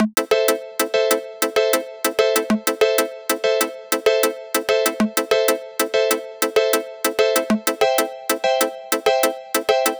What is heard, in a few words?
dream skank melody